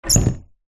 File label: door cupboard close fastener squeak